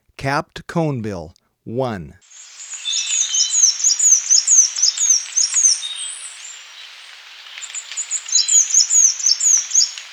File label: Capped Conebill, Birds of Ecuador; S. America